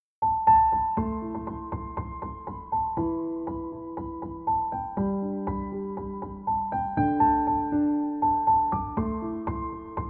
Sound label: Piano Instrumental